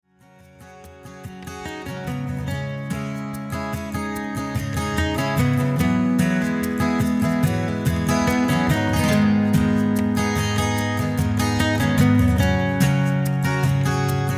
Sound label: perc